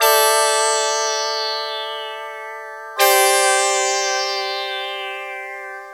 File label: Quadra Chords, Synth; Keys; Synth Sequences; Drum & Bass